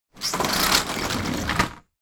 van side shutter roll down

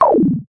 cartoon sci fi beam; zapsplat

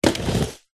rollerblade single roll step; foley

